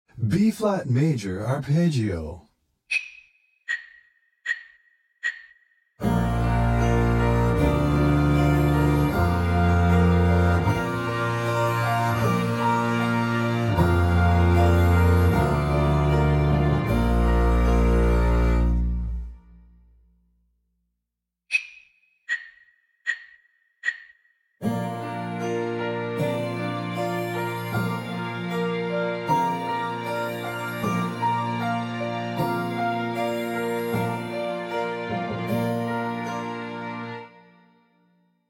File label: Bb Major Arpeggio